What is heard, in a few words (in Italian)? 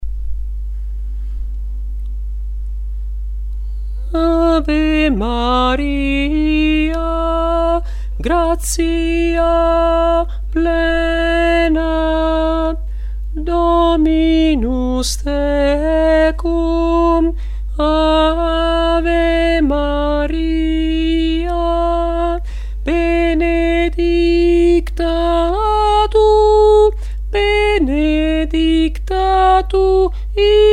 contralti